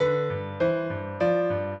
trio, piano; Mozart

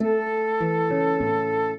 minuet, flute harp; Mozart

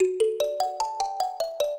minuet, mbira; Mozart

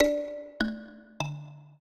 trio, mbira; Mozart

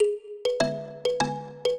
minuet, mbira; Mozart

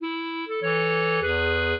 minuet, clarinet; Mozart

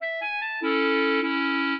clarinet; Mozart

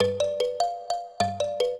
trio, mbira; Mozart

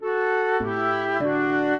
minuet, flute harp; Mozart

